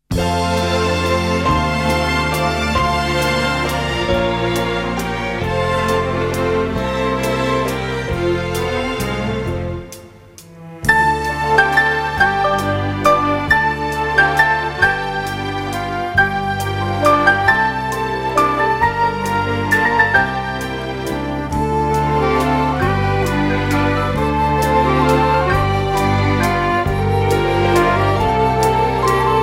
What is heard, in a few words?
European Waltz, Ice Dance Music; Bronze